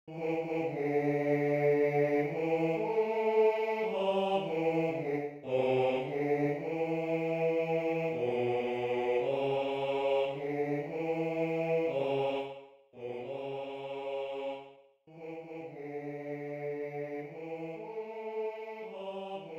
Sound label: bass